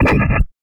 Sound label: Drums; Distored Reality